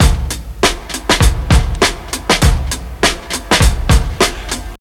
100 bpm drum loop sample c sharp key, kick tuned to the C# note